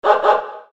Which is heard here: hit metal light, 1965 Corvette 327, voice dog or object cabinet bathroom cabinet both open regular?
voice dog